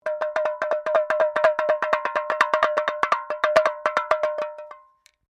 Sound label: Drum Small Rotary Hand, small festival drum; Musical